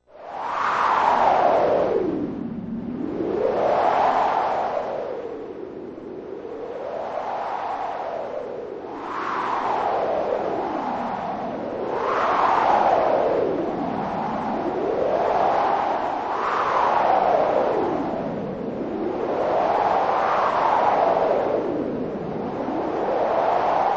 Wind, High quality stereo sound of windy gusting and blowing; Wind (Sound Effects:Weather)